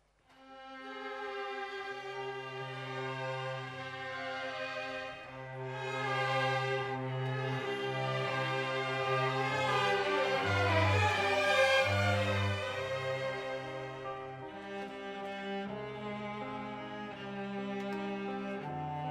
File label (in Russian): скрипичный ансамбль; Молодежное общение г. Апшеронск